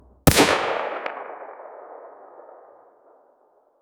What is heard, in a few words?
bass boosted anechoic